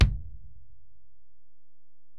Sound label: Ac BD; Alesis DM-PRO